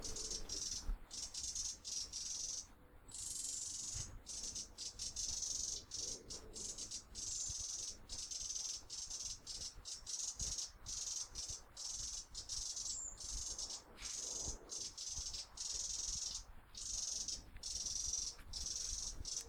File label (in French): phragmite des joncs, oiseaux